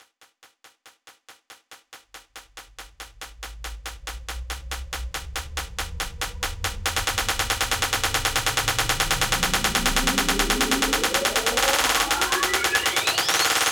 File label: Snare Roll, Drum Loops 140bpm; Zero-G Trance Inducer